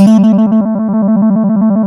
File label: BASS TRILL A4; MX+BASS